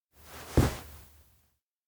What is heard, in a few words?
rest head chest; koyomi; anemoi パッケージ版